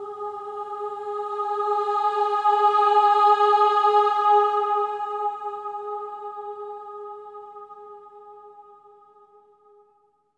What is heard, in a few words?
AH G#4, swell ahs, Symphony of Voices; Spectrasonics